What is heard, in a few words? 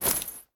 armor; Smite; Tiamat